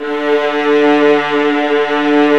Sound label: St Arco Violas Vib